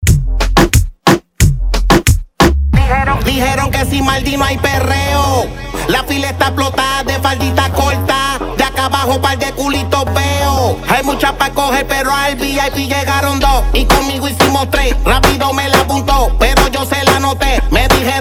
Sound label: Remix 90 BPM